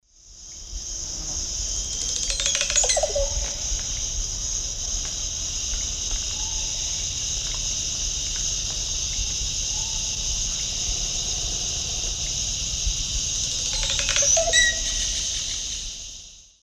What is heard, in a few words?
Psarocolius yuracares, song; S. America; Eastern Lowlands